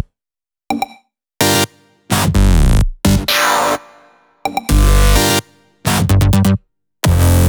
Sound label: Piano Melody Beat Loops